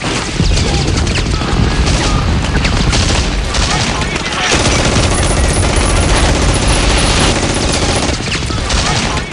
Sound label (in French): combat guerre